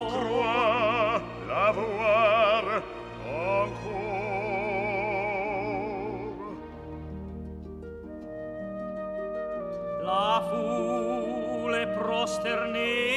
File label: duet